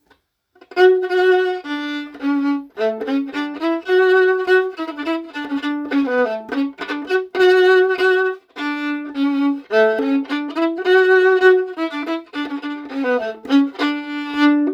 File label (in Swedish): Polska från ön dur